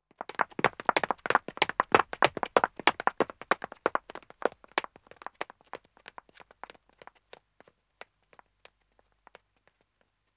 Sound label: Wood (Dead Acc) Running 1 man, Footsteps; The BBC Sound Effects Library